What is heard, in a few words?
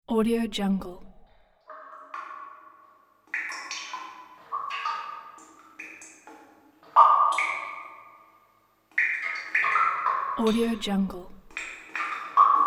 water drops in cave